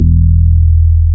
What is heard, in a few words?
BAS MKS-50; Sound & Vision - Gigapack II CD 1